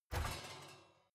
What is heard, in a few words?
oven open baking sheet ringing hard